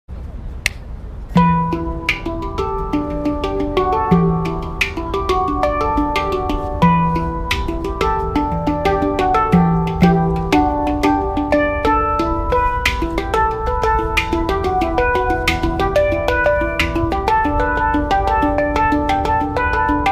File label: Meditation - Hang Drum